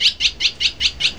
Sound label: cat